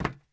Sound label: metal box run